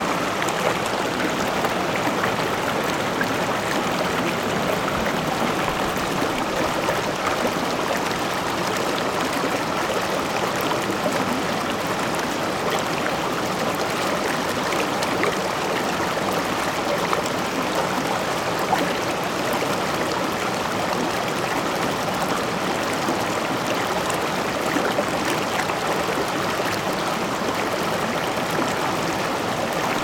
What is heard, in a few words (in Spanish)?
rio Jadibamba en tragadero; Río Jadibamba, 2022